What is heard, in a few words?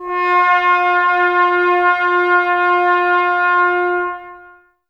brass, Tbs Mute